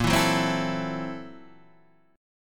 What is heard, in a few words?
Fsharp 7th Sharp 9th Flat 5th, guitar chord